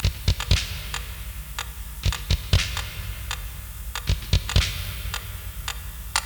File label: sound check drums